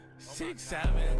doot doot

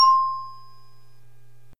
free throw ding

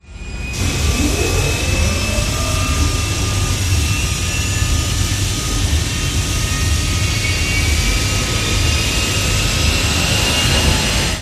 startup

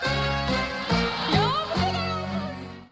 haha

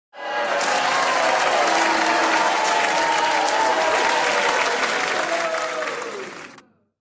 applause and boo

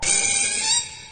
scratch

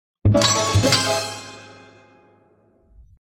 mystery reveal

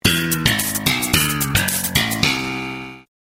sitcom transition bass riff; seinfeld

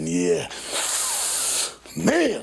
hiss; hiss man

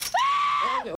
shrimp scream

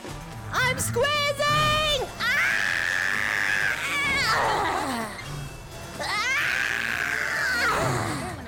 squeezing

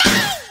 auw scream; michael jackson

